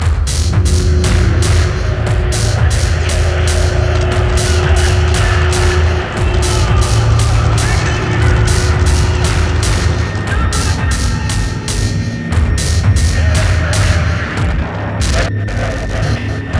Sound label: Rubble Music Loading; Battlefield 3